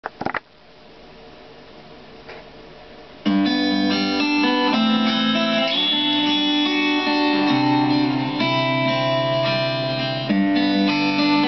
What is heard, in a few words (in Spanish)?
Oceano Breeze